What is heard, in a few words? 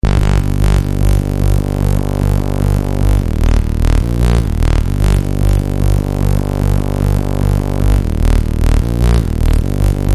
Plan-B Model 25